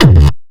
bass kick

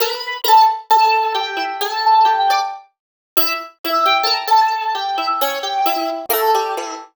lead; spookphunk; Sonic Foundry (Sony Creative Software); Ugly Remnants VOL-2; Leads

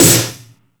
Percussion FX, E-MU Pro-Cussion

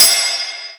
PLATE, Drum Hits, METAL

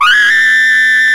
SAX T. GROW, Tenor; Roland LCDP07 Super Sax

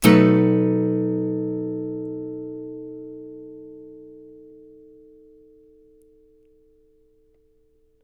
CHORDS, Blues Guitar; ILIO; Fretworks

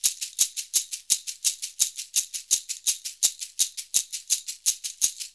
SHK, Shaker; Univers Sons; Basicussions

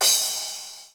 Crash, Percussion; Giga Samples Collection; ELQ-DrumQit

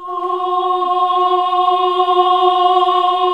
OHH F#2, CLASSIC VOX

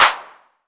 clap, Roland R-70

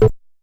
Perc Lo, Stylophone Beatbox

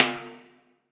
R TIMBALE, Drum Mashines; EdgeSounds; Drum Mashines VOL-1; R-100 DRUMS

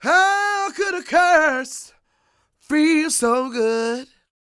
SING W, Vocal Planet Blues & Soul, BLUESY; ILIO

